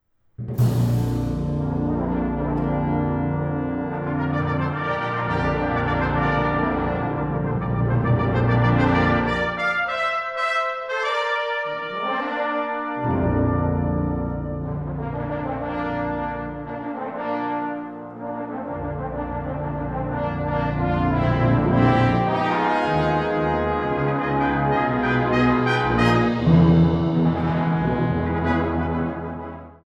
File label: Brass Band